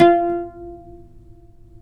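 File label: pluck OUD F; Roland - LA Composer vol. 3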